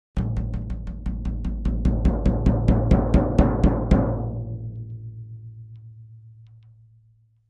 Drums Toms C Low Slw Do

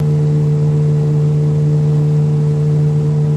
9.9 Evinrude Boat Drive Loop Driving, On Board

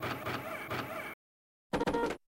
Boxing Robot Fall, servos

